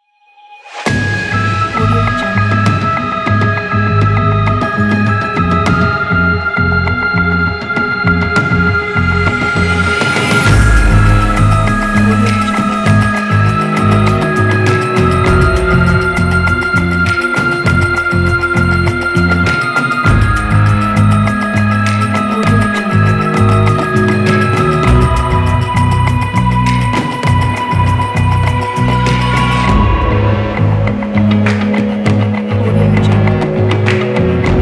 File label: cinematic mysterious intro